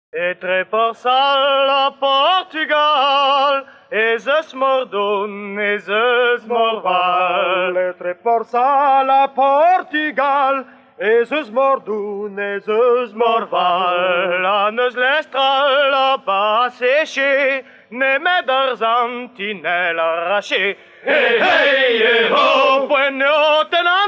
chant Breton; Chant breton : Etre Porsall ha Portugal

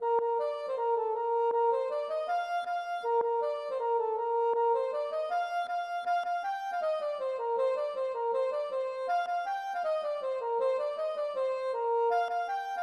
piler lann

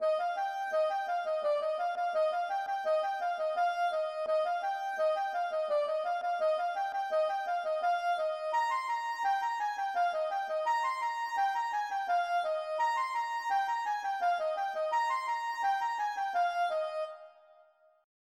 ridee

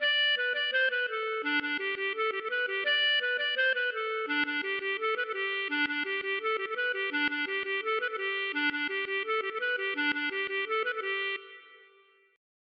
maraichine

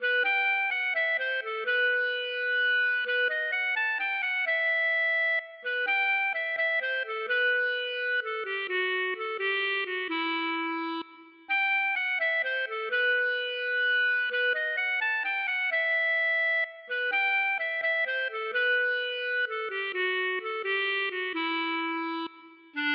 valse

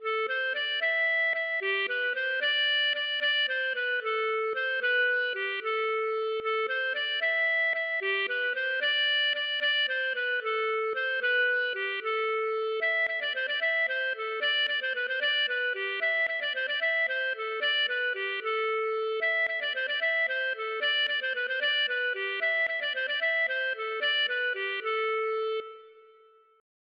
valse

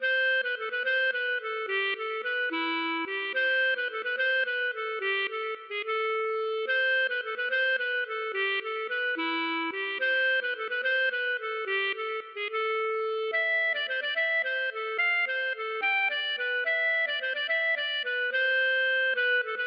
bourree; Alambic

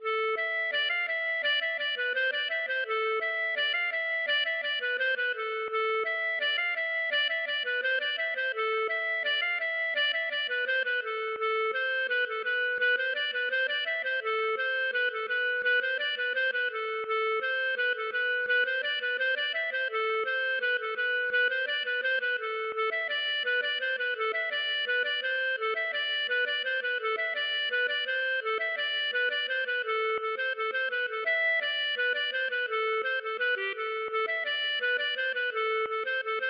laride

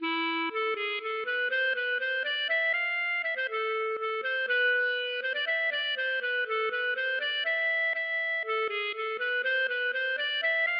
tango

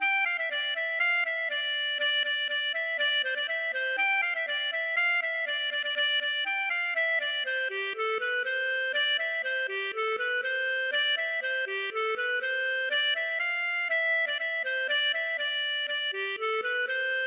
veuze

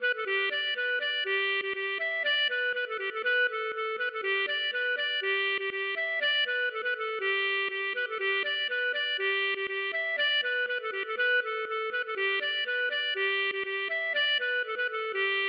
marche

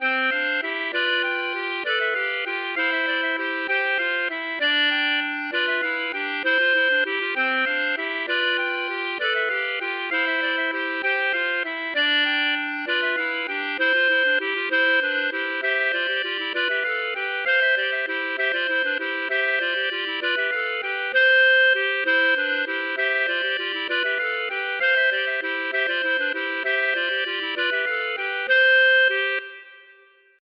bourree; Porte Chopine

